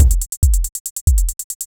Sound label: Beat, Drums & Percussion; Electrocution; Beats